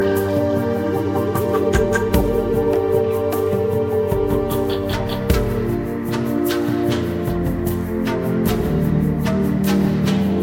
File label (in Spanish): relajacion